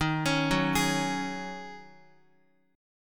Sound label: D sharp Minor 11th D sharp Guitar, chord; arpeggiated; JGuitar